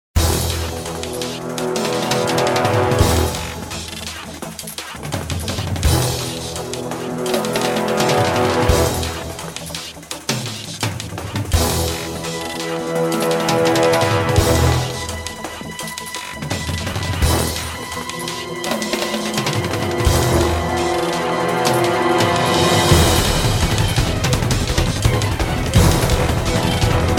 Action Short; Emergency 4 Global Fighters for Life

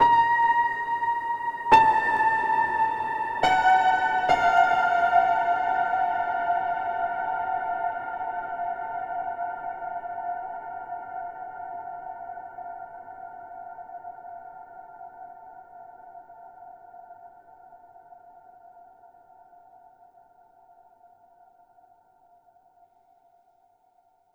Overlay Tone

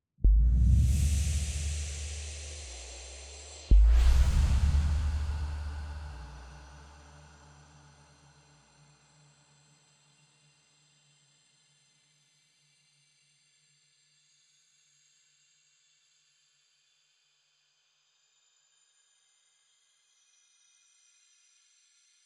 Fruit Fill; Forest SFX

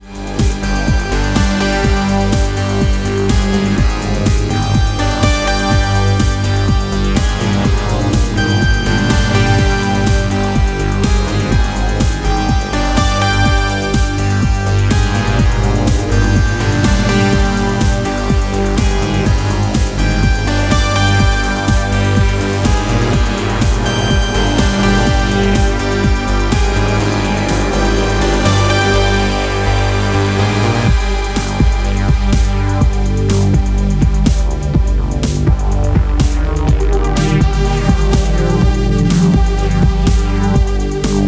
Amped Synthwave

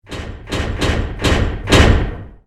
Male Jumpscare

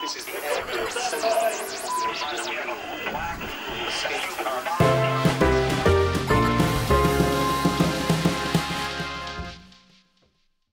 gameplay interstitial public radio; meteor

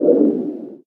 Ghost Block Passthru

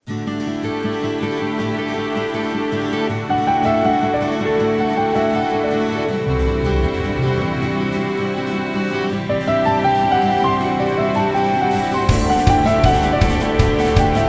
Normal Field

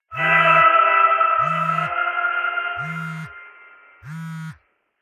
Cellphone Ring (Devil)

